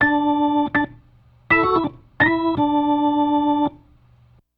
Soul Organ Riff, 70s Analog Funk; Sonic Foundry (Sony Creative Software); What it Is!

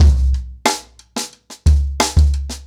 90BPM, ACOUSTIC DRUMS; Sonokinetic - The Roots Drumloops

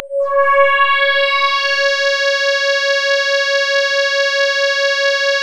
C#, Synclav FM; Big Fish Audio; SPACE1

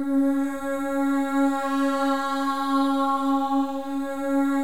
MOVING V2, Symphony of Voices; Spectrasonics

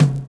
TOM FILL; Univers Sons

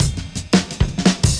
Beat Style, 170bpm, breaks

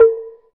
CLAVE, Synclavier Percussion; ILIO; Synclavier Percussion 2; World & Orchestral

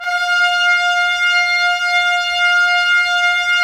Trumpets; Roland - LA Composer vol. 4

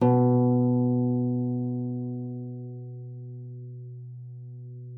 A#2, HARP FORTE; Xsample VOL-8

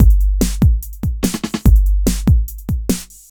Kick Snare; Sonic Foundry (Sony Creative Software) - Electronic Point Blank

